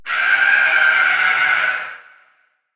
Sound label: megaspud hit pitch; minecraft